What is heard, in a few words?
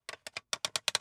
Hoist Boat